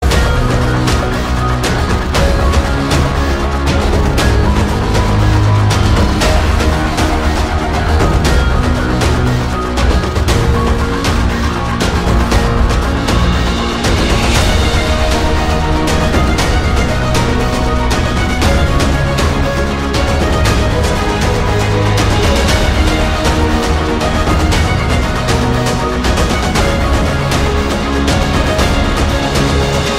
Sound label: Epic Score